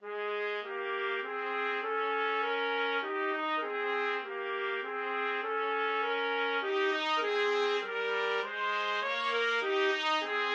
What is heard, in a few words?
Menuett in C Mozart trumpet duet